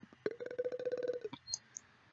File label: jumpscare